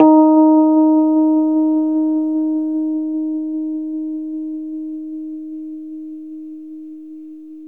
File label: Rhodes 73 Slow, D#; E-MU Producer Series Vol. 5 – 3-D Audio Collection; 3DRhodes